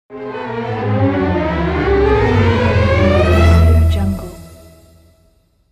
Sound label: cinematic strings logo